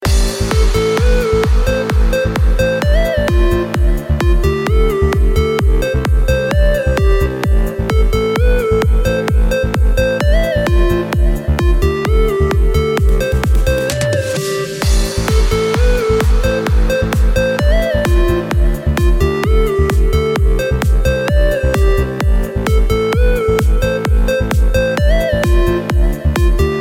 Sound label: Zang Mobile Sport